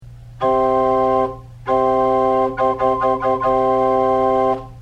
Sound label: c cord, organ